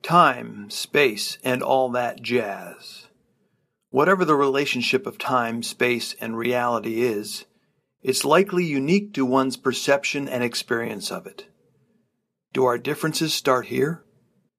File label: time space jazz